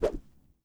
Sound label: Swing Cane